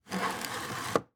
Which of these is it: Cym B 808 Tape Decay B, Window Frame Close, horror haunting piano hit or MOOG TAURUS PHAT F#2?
Window Frame Close